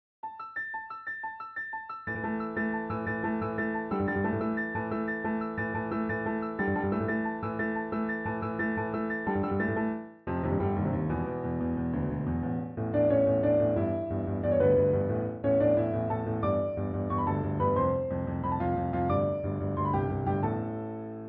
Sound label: Title Screen; supernintendo; Street Fighter II